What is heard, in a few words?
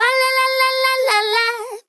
vocal syllable phrase lalalala stacked dry 122 Ebmaj